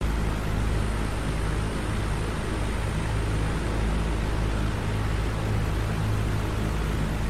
air vent

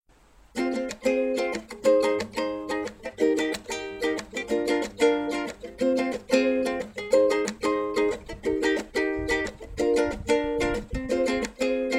ukulele refrain

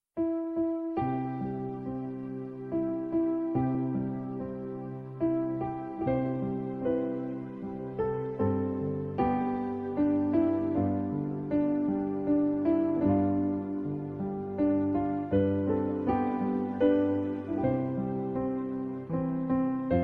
brahms lullaby

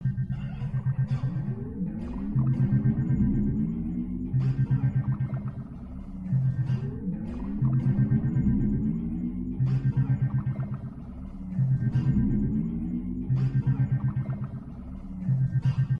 flying saucer

